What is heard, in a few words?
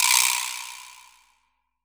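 VIBRASLA, drum machines; JV-1080 DRMS; EdgeSounds; Drum Mashines VOL-1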